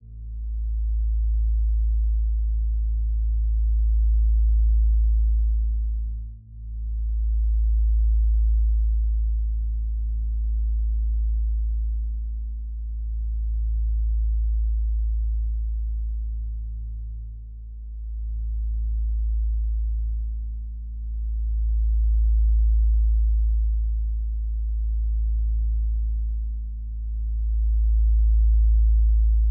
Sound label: Analogue Synthesizer Filter Resonance Wave Unison A1; 344 Audio; Low Frequency Elements